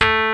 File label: RHODES A3; Vintage